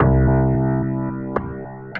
Scan Loop Ffwd, Granulator; Ableton; Granulator III; Device Templates

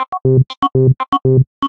Tone Seq, Vector Wave Sequences; Ableton; Retro Synths; Components

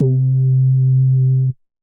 Hard PWM, Synth Lead; Ableton; Skitter and Step

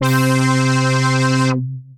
Organ, Synth Keys; I'm A Star Organ; Ableton; Creative Extensions; Poli

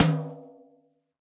Tom Mid Old School; Drum Hits; Standard Hits; Ableton; Factory Packs; Session Drums Studio

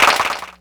Clapbox Pitch; Ableton Foundation FreePacks

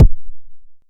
analog quick, Kickbank

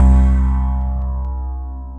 Creeper Approaching, Ominous Pads; Ableton; Mood Reel

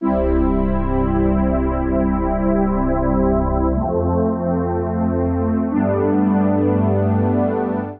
Super 80's Soundtrack (C) 120bpm; Music Loops; Ableton; Factory Packs; Singularities

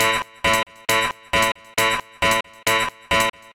Guitar Siren; Suicide; Ableton; Breakbeats by KutMasta Kurt

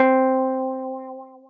Upper Harmonix Wah, Synth Keys; Instruments; Ableton; Synth Essentials; Tension

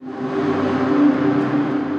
Foggy Pad, Ominous Pads; Ableton; Mood Reel

